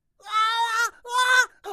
Hysteric Bwaah; Rabbids Go Phone Again

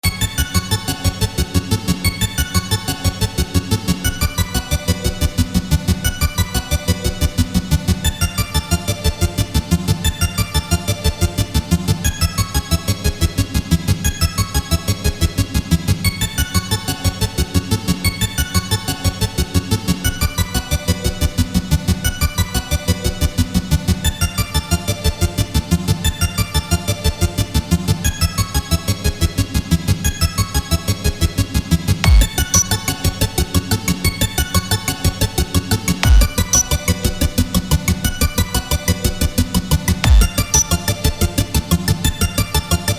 Spooky; VHS Overdose

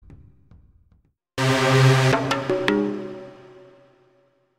Game Start; Art Style Penta Tentacles; Wii

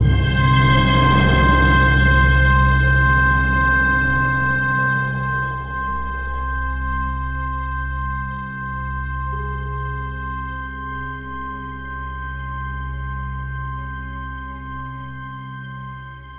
sting Shock; the walking dead michonne 3 what we deserve

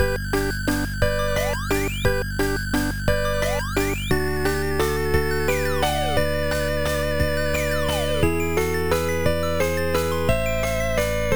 Amusement Park BGM

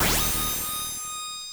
Credit SFX (Push Button); Gemini Wing; X68000

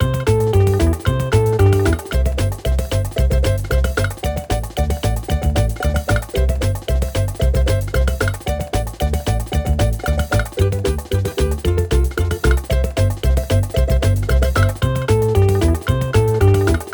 musicloop; easteregg; breakout; SpongeBob Patty Pursuit

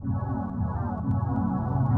Monument Park C - Ambient; LSD Dream Emulator; PS1

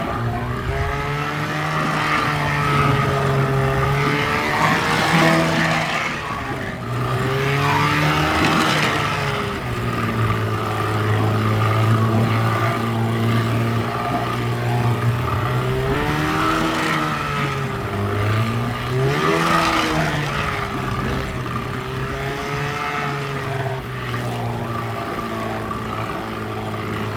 vehicle wood boat drive

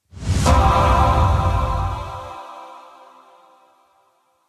suit fanfare; Tumbleseed

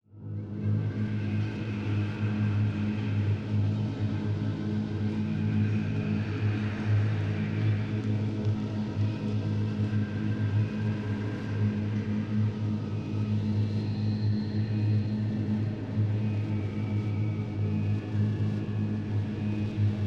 ambience; prelevel 5

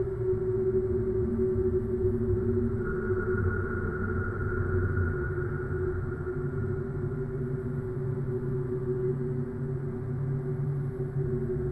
horror music; darkness within 2 the dark lineage

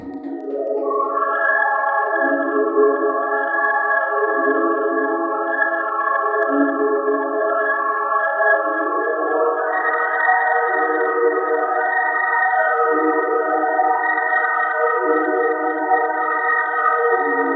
pads

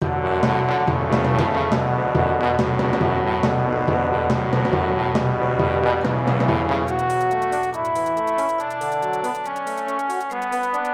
Canon (orchestra version); Cargo! The Quest for Gravity; Pahelbel

